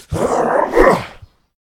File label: boss rage; astro Legends Lyra and Erion Desktop; Foxium